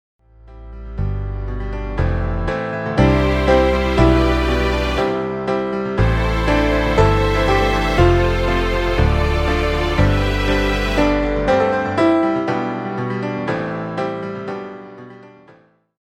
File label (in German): Jingle Projekt; steinhundert